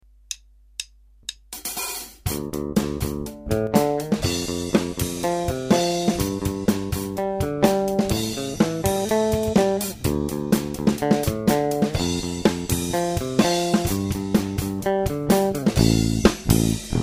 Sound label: Ripper, Gibson bass